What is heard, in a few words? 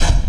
FX Hit; One Shots; Drums & Drones